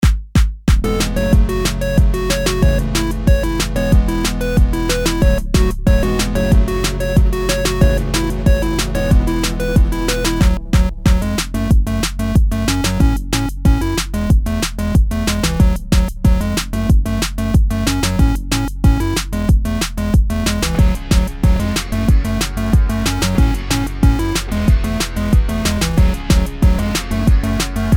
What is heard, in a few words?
Beat Main Theme